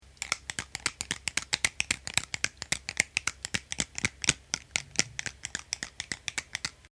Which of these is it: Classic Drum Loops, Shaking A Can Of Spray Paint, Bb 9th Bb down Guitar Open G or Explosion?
Shaking A Can Of Spray Paint